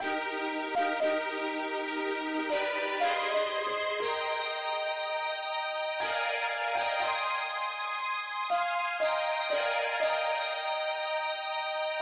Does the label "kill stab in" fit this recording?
No